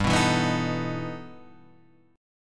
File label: E Minor Major 11th G, guitar